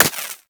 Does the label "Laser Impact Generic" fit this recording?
Yes